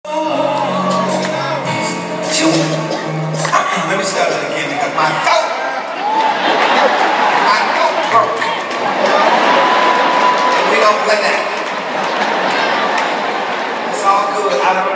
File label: Summer Soft